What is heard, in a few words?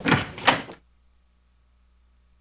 Door Open Latch Move